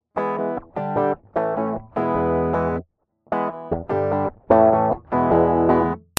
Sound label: American Lead